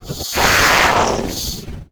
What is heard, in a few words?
imp sight, monsters; DoomRTX; imp5